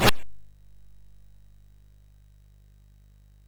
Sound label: Power of Black Bandit, MOS6581R2